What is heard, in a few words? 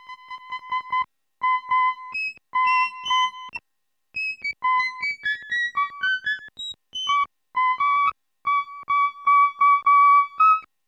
sk-2 flute env pitch